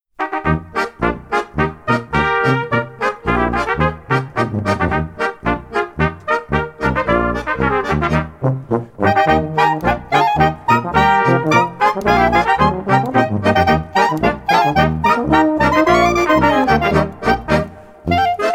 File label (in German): Schottisch